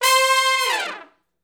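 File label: Hi Short Falloff (C) unison, Horncraft; Sections; Crimson Blue and Fabulous Horncraft 4 RnB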